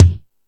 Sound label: Lotsa Kicks, Drum Machines E-MU Pro-Cussion